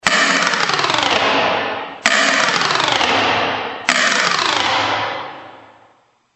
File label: cutaway